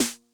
Snare High Tuning Mid Tone Max Snap, 909; classic machines

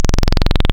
Saw, wavetables; Animoog

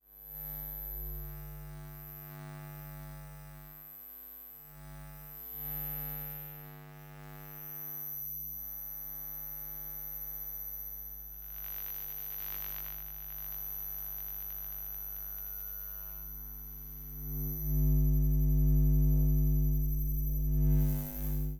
Electromagnetic Fields, Hummin; electric fields

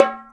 BONGO; InVision Interactive; Percussion Slam; ZOMBIE

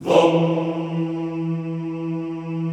Classical Choir Hmmm E2; Best Service; Extended Classical Choir

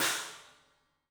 CYMBALS; 3D Sound; 3D Drums

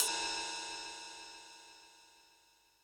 Roland U110 Drums A#5; drum machine

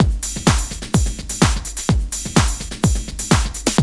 loop, Roland; Sound & Vision - Gigapack I

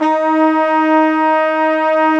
WND FGL SO, Hybrid Winds Flugel & Sop

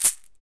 SHEKE, Afro Shakers, Shekeres

